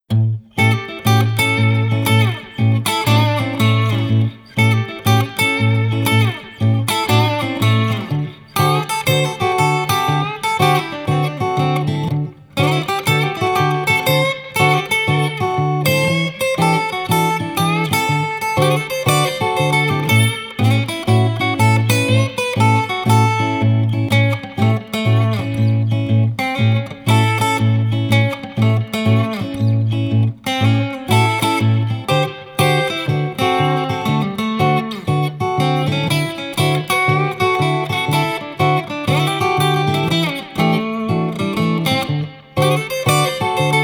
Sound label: Guitars Acoustic Jam 120